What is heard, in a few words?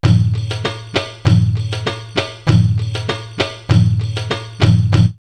Sandy Saudi 98, drumloops World Ethnic; X-Static Goldmine